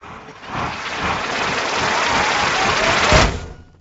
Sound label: Cog Death; toontown-just-works